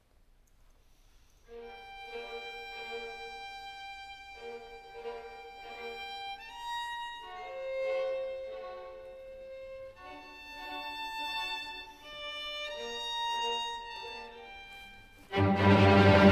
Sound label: summer mvt 2 adagio violin; 4 seasons; vivaldi; Les 4 saisons; musopen